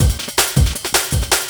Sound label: Beat, Drum N Bass; Sonic Foundry (Sony Creative Software); Knutrix Drum & Bass